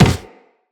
Operator Walk Wood, Footsteps

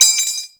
Bayonet Clip Drop; Warframe; Tenno

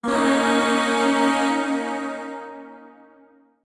Shawzin Yo Chord K; Warframe; Zariman; Void Shawzin; Chords

